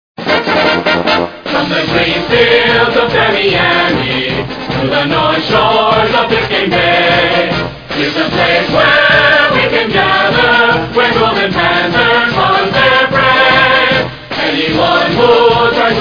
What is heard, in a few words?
Fight Song vocal; Florida International FIU; NCAAF Football